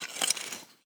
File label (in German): Harke Solo; Farming